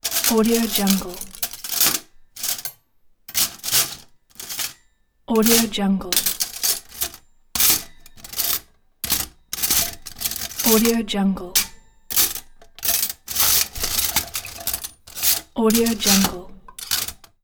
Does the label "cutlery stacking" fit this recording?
Yes